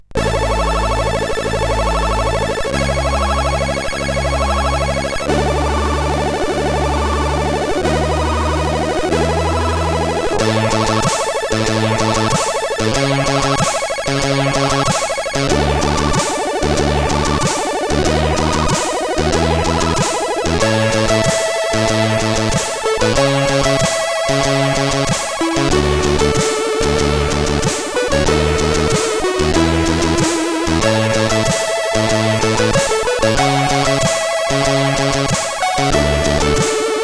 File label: Hi-Score sid CSG8580R5; Onslaught